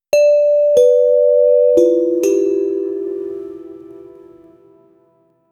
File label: lick sound light backgro, background music